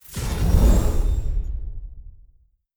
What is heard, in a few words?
witcher Expand; netent